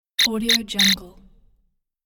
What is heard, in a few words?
time up game